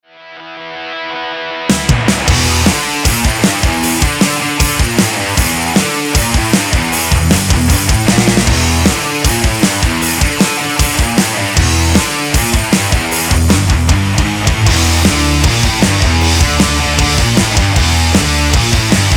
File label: soothing dreams metal version instrumental